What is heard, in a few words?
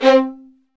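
STR violins, Roland; L-CD702; Vlns Marc4-5; Vls Marc4 x8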